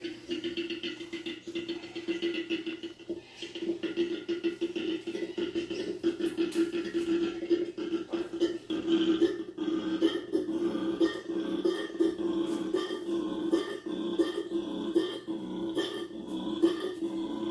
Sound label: Koala bellows